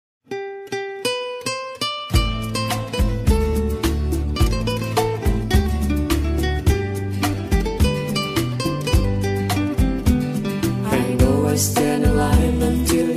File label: Rumba